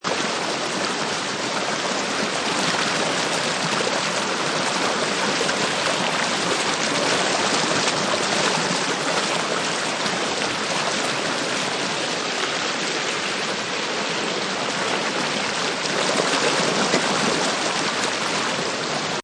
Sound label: River Or Stream Close, Water gushing and flowing unevenly through ro; River or Stream Close 02: Water gushing and flowing unevenly through rocky stretch of river. Perspective close to water. Seamless